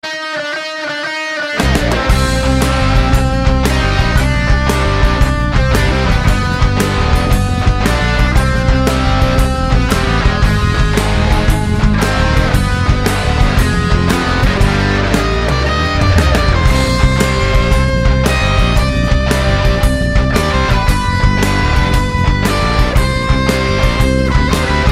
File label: Kurdish Rock